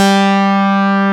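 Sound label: poly synth G4, Memory Moog; Greytsounds VOL-1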